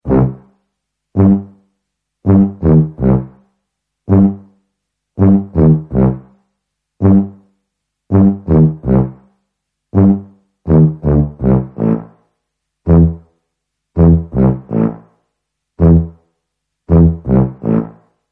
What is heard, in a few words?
just tuba